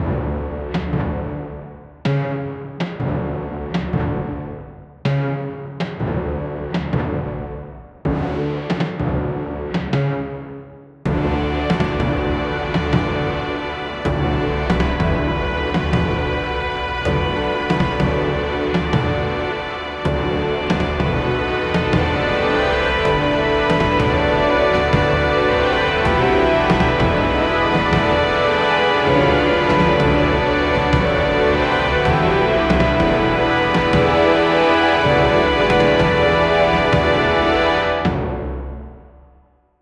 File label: epic orchestral boss them; film score